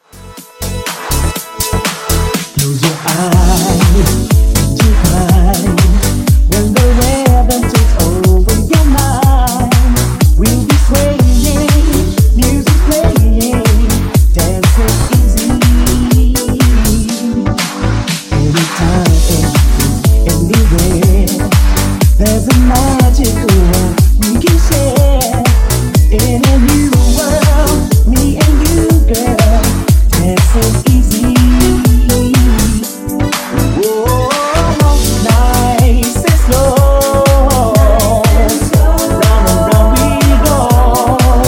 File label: Disko Funk Edit 122 Bpm